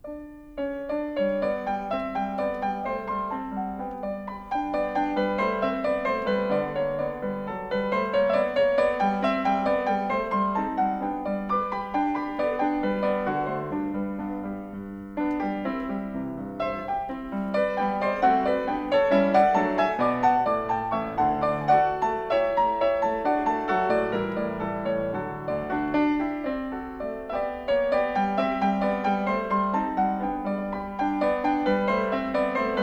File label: Six Variations in F Major for Piano, Op. 34 Var. III. Allegretto; Beethoven